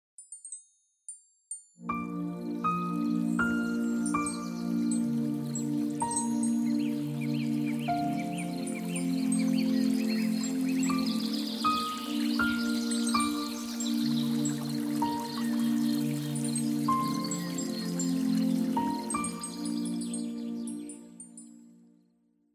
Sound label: oneplus original ringtone